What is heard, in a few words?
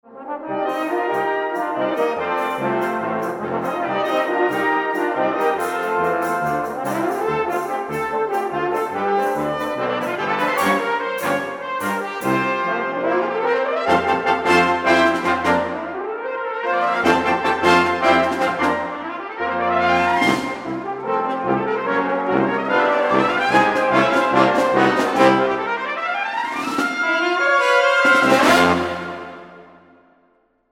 10 for Brass; Verhelst